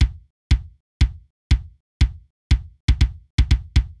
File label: kick dry; hydrogen